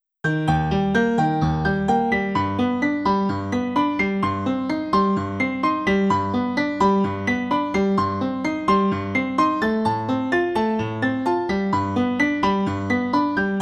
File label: Sunset Piano Short